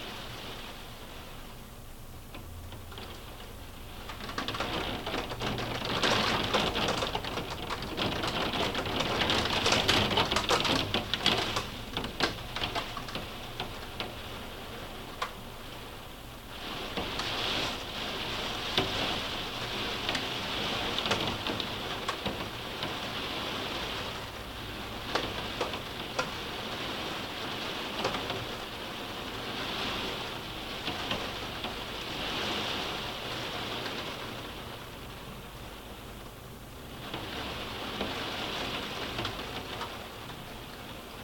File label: rain on attic window; Rain on the same attic window.